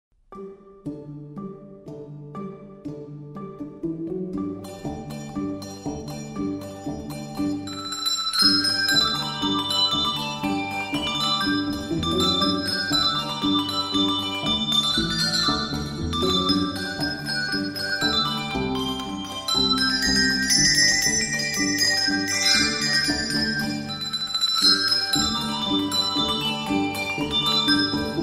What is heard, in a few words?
Handbell Music; Handbell Music Sampler